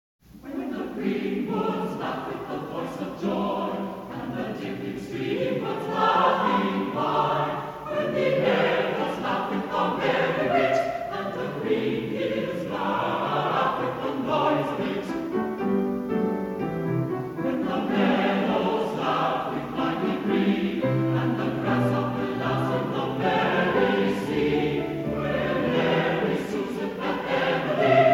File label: Songs of Innocence Laughing Song